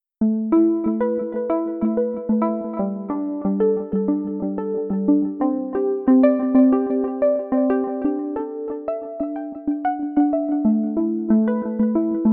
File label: linnstrument test